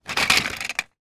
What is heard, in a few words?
toy mega blocks movement short; zapsplat